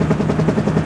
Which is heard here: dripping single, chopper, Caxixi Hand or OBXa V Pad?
chopper